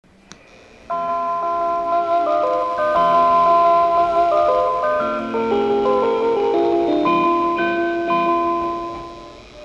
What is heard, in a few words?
chime; wakayamadentetsu